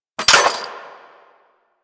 Fire Sweetner, Weapons; Warframe; Gorgon

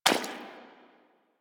Footstep Default; Warframe; Pirate

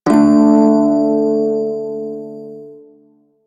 Prime Shawzin Chromatic Chord C; Music; Warframe

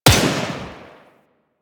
Tommy Gun Shot 3D; Weapons; Warframe; Tenno

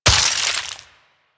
Vial Ice Shatter; Warframe; DE; Warframes; Alchemist; Elements; Ability

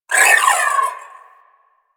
Alt Proj Whizzby, Akimbo Pistol; Warframe; Weapons; Corpus; Briefcase

